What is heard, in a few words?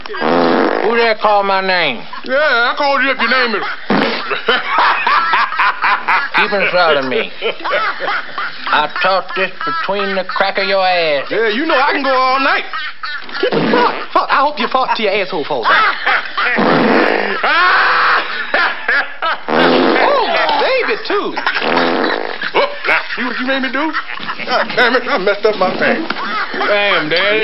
fart scene; Nutty Professor